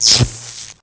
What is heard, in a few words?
sparks; starship trooper